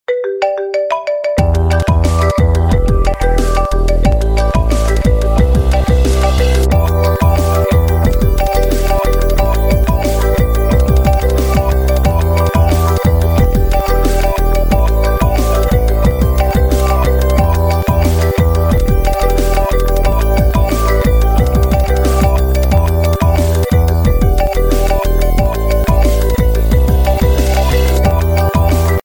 apple iphone marimba remix ringtone

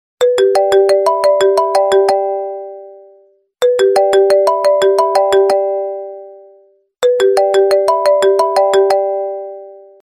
oppo f5 original ringtone

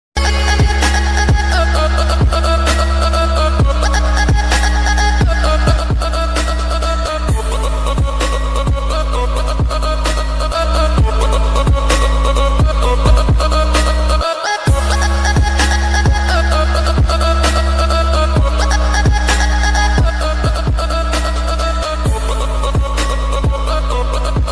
trance club ringtone

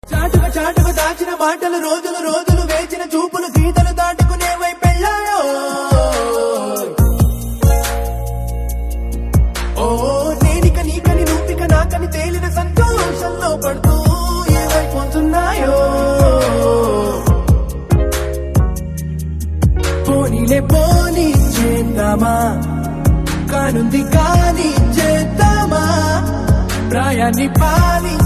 telugu tune ringtone

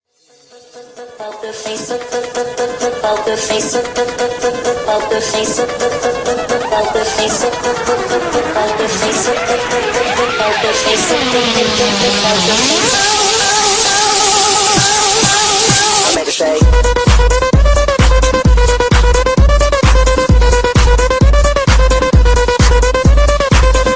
electro club house ringtone

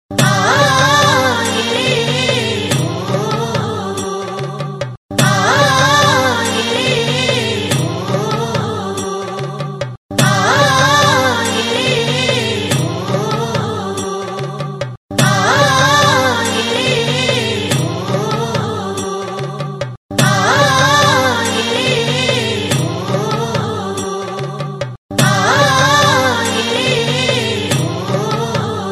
malayalam nadanpattu ringtone

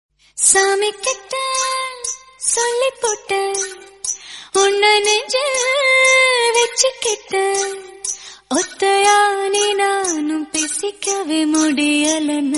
srilankan tune ringtone

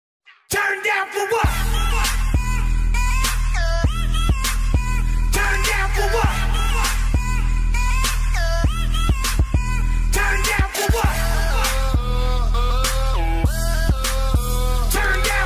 new trance ringtone

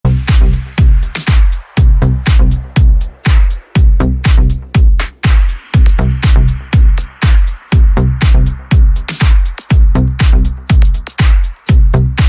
iphone7 tone ringtone

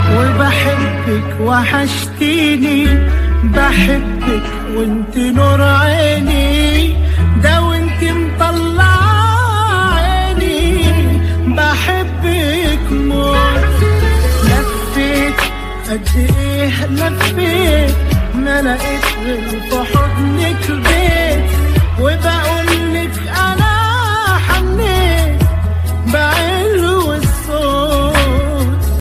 arabic ringtone

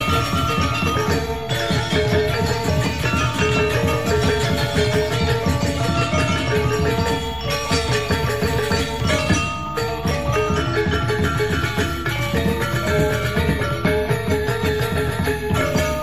Indonesian gamelan Bali ringtone

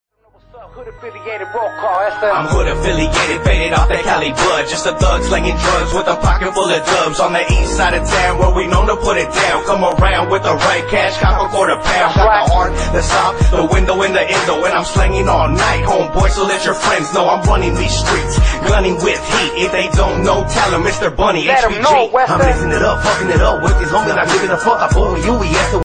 ringtone

